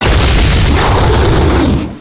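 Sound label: spawn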